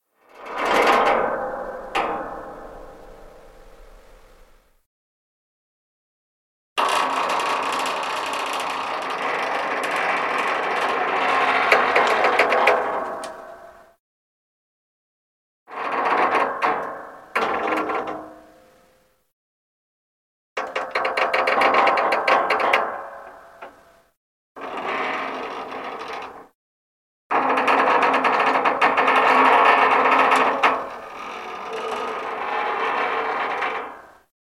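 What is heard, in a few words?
metal tower creaking; modern warfare 2; amb emitters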